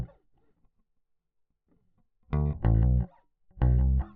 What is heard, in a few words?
Bass